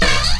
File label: beat, drums